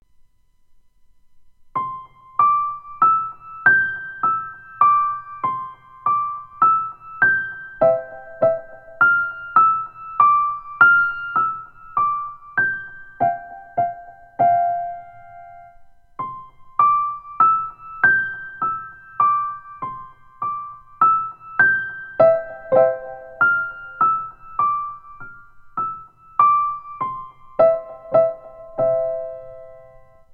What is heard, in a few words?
The Singing Music Box